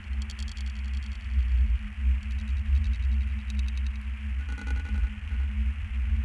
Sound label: ambient